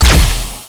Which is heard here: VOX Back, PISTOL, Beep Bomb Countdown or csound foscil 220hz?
PISTOL